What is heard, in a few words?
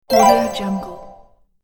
points scored bonus